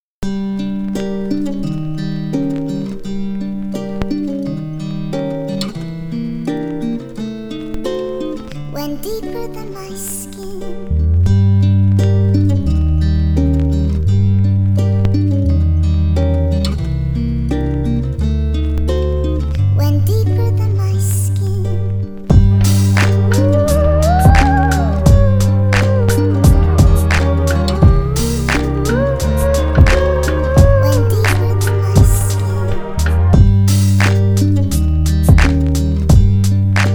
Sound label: instrumental